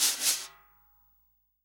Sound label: SWING SNARE CHROMATIC, Real Drum Kit; REAL DRUMS